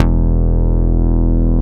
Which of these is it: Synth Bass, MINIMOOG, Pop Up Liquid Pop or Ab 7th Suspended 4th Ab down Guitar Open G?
MINIMOOG